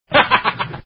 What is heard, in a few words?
Laugh